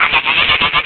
Shock; Link getting shocked

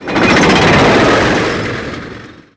Ship Platforms

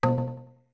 Drums B short

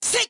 Attack

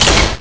Bound Chest Open; The bound chest opening

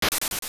Bush Cut; A bush cut (or long grass or leaves); Zelda Oracle

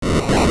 Block Push; A block being pushed (also a dungeon lever being pulled, and spinning spike traps moving); Zelda Oracle

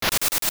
Bush Cut; A bush (or long grass) cut

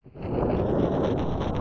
Fire Trap Start; Fire Temple; Zelda; OOT

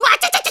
Burn; getting burned; Link getting burned; zelda

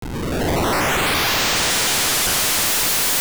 Woosh; Oracle

